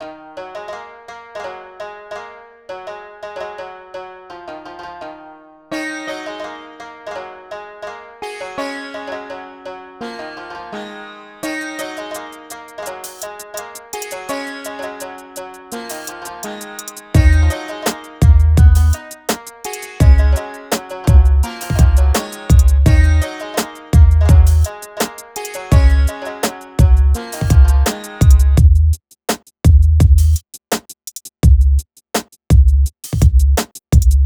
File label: 084 Ebmin, Hip Hop Exotica; Apple Loops; Big Fish Audio